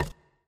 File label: quick stop; fireflies